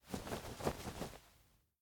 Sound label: Wave A Hand; LOOPERS